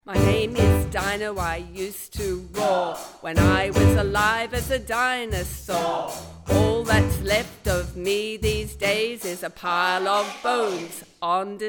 Rap

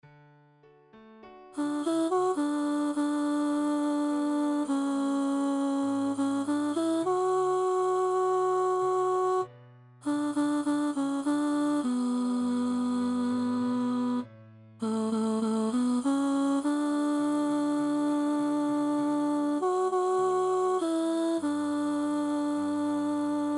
Alto

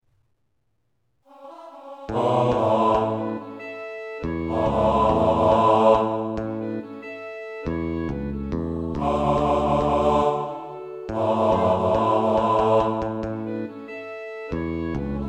Bass

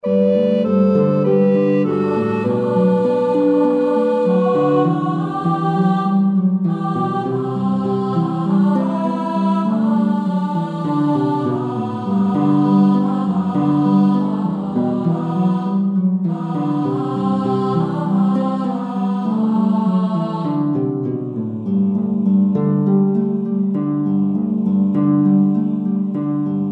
alto

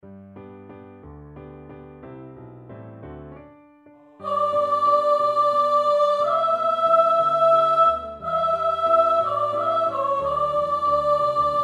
Sop

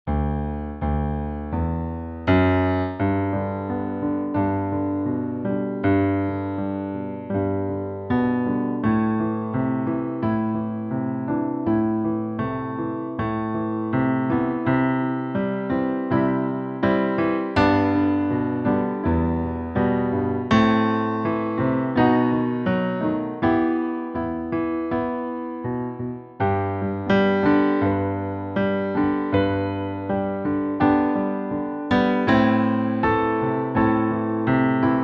Piano Acc; Green Grow the Rushes

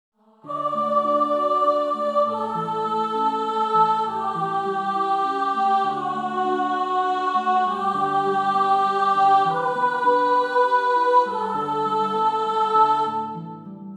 Steal Away sop

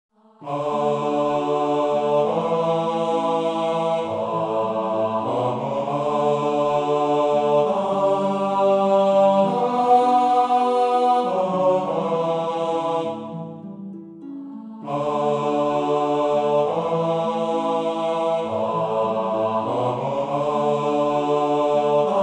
Steal Away bass

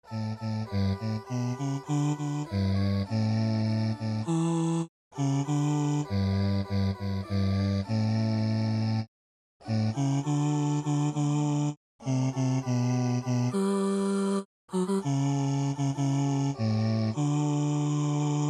bass

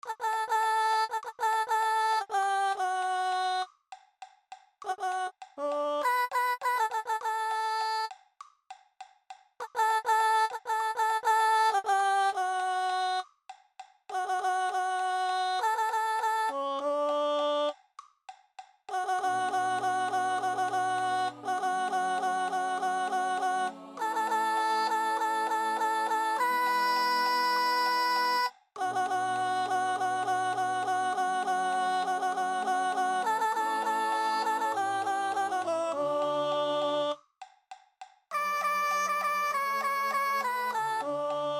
Alto

